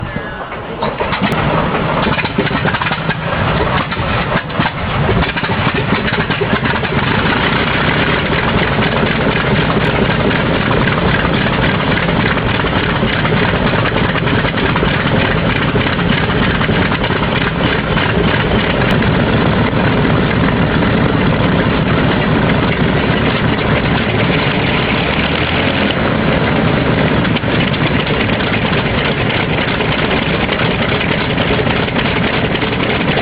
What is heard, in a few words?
B25 Startup